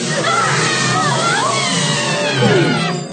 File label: screaming